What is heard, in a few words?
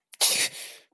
shock